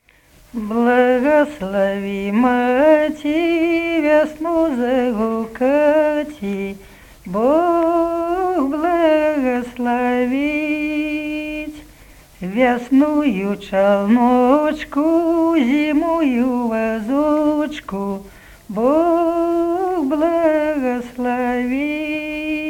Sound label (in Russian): Благослови, мати (гукальная)